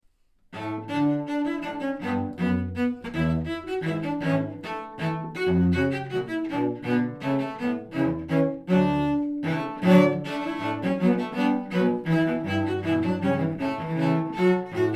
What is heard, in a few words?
Cello Trio; Holiday; 3 Cellos , Cello Trio